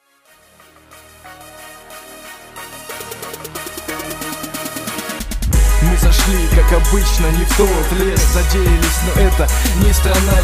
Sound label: instrumental